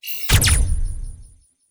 Test Clear; Yu-Gi-Oh! Master Duel

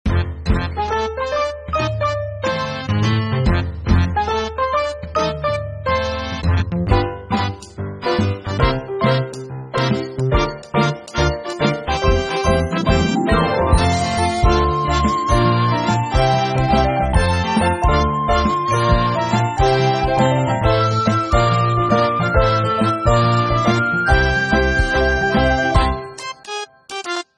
Battle Bonus Time Bgm; doraemon gadget rush android ios mobile gamerip 2015

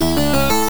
Checkpoint Jingle; Titus the Fox; To Marrakech and Back

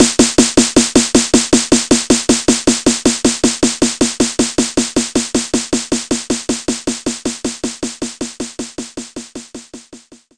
snare

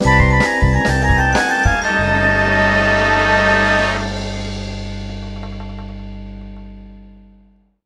Guit; Bart

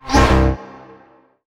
ui upload icon error

